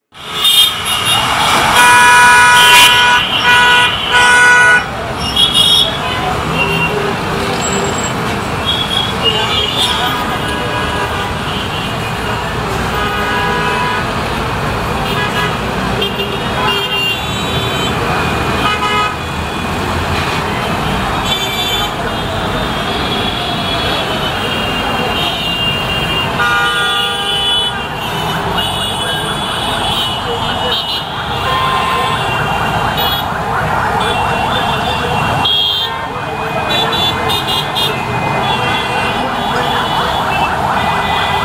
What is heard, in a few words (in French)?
bruit circulation